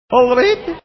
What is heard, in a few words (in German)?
jodel